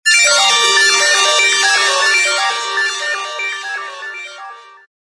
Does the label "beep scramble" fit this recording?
Yes